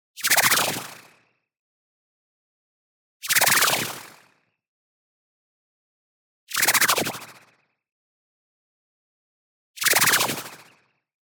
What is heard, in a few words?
movement fast, resonant bandpass noise shift; boom library; Anime Essentials; DSGNMisc